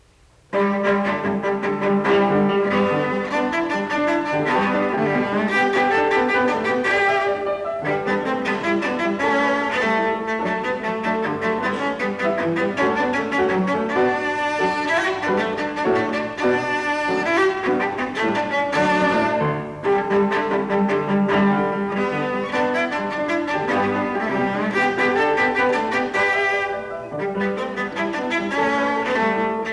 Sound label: Pieces en Concert; Couperin